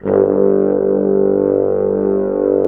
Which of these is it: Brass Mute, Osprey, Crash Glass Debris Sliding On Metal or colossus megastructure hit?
Brass Mute